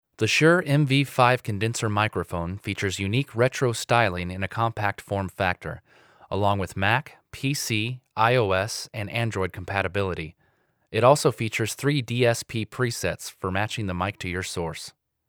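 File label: Vocal Shure MV5 Instrument; usb mic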